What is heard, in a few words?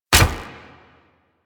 Shot, Weapons; Warframe; Infested; Inf Cernos